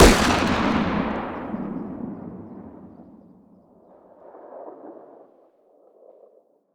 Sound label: fire dist 50ae pistol ext; gmod; arccw uc commons attachments